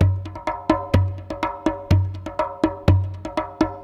PERCLOOP ETHNO 125; eJay

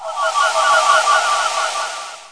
8-bit Seagull Beep

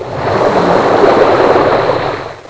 wave; Virtual Sailor

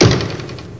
wood; PC Gamer IT CD 38; thief

